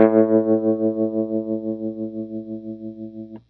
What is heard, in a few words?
GRTCH TREM A1; Sonic Reality; American Heartland